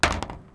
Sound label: metal; Mafie 1